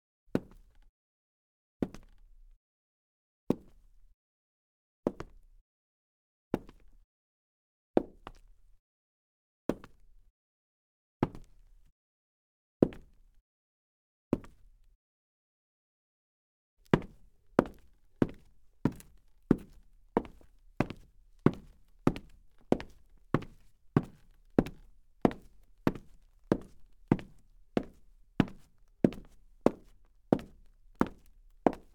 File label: Wood Soft Dress Boots, Foley Footsteps; Sound Ideas